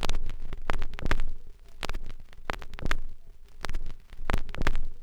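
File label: vinyl, click crackle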